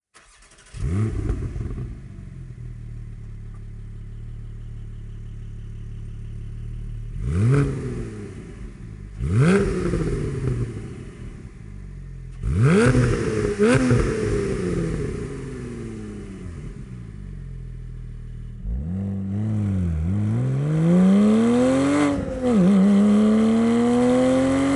Slip On Race Line Porsche 718 Cayman GT4

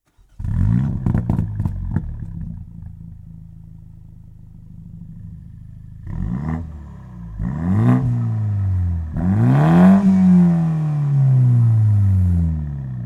Slip On Line Titanium, autoparts

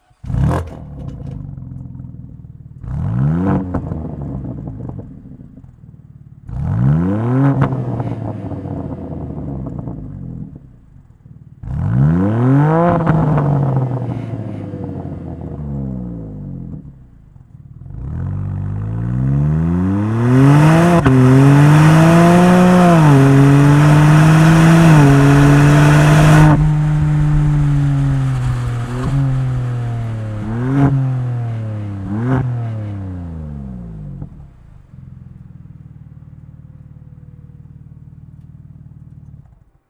SLIP ON LINE BMW M2 COUPÉ G87; AKRAPOVIČ

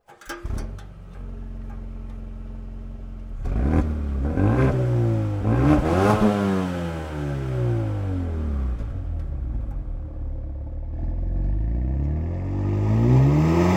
Slip on Mercedes AMG A35 W177

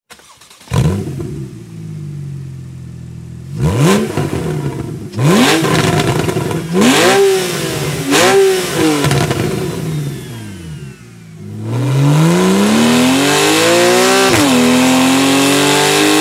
Slip On open valve Porsche 911 Carrera S

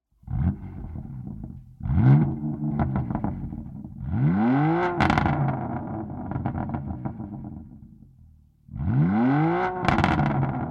Evolution Link Pipe set BMW M2 Competition

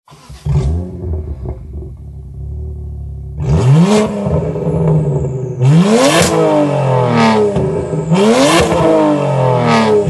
Evolution open valve BMW 1 Series M

